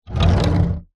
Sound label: foley wood large board drag ground